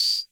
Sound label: tamb long, CR-78